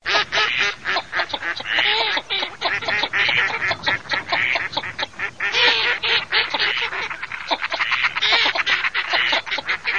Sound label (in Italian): GERMANO MESTOLONE